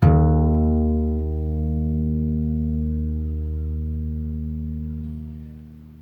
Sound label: guitar low e